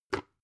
plastic bowl grab

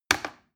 plastic button little click heavy fast

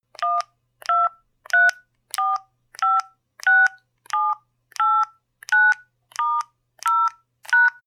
landline dial sequence

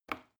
plastic button small push regular

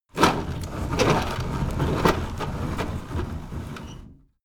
window roller shutter close fast

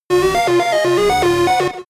game arcade race futuristic

